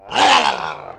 Box; JONAH

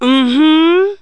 VX MHMM; REDNECK; RAMPAGER

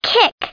kick

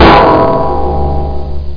Collision; EMP; Music

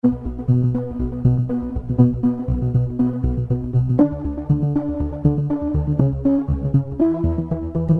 Arp

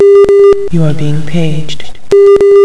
page

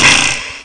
Arrow Shoot Sound; Ferazel's Wand Demo

